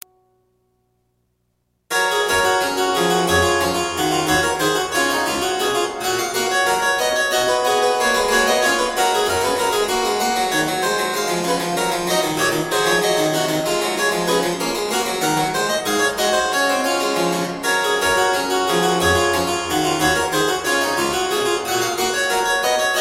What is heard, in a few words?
Variatio 19 a 1 Clav; Bach Goldberg Variations; Johann Sebastian Bach